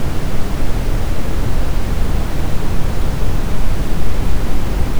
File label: noise